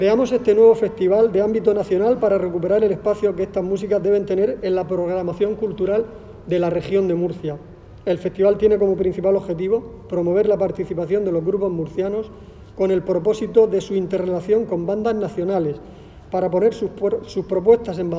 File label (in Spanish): FolkFest